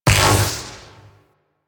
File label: Vial Magnetic Shatter; Warframe; Alchemist